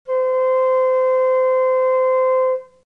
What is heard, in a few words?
C sharp 5 baroque, flute